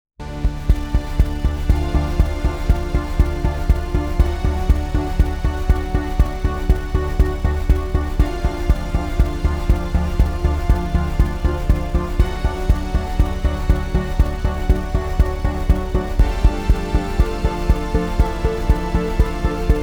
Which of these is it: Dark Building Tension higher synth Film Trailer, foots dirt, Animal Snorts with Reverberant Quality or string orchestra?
Dark Building Tension higher synth Film Trailer